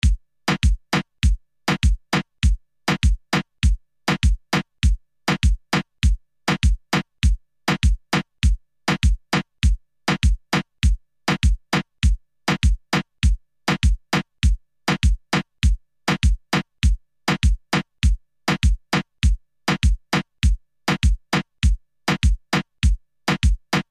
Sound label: carib beat 100bpm